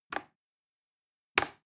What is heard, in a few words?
plastic button little push regular